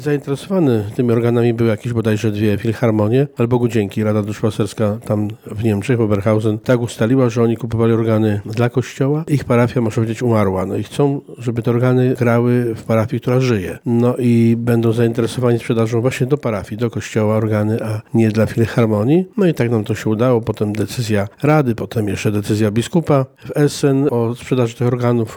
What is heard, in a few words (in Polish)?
organy